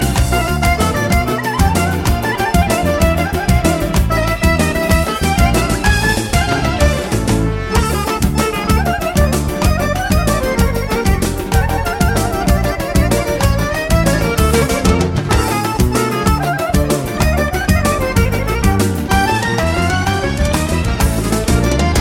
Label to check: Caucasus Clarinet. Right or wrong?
Right